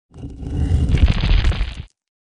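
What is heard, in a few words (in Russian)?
с трещинами камней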